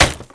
refinery add; garrysmod

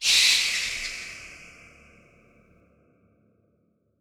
sewer bat; garrysmod

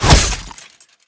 spear shatter; garrysmod

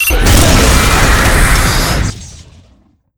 new vaporizer; garrysmod

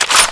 m95 back; garrysmod

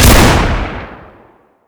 slug; garrysmod

